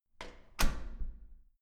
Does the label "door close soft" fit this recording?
Yes